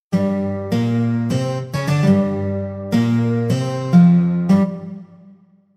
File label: acoustic mix